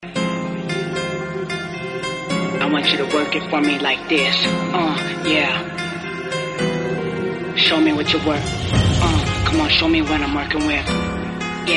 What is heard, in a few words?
theme ringtone; race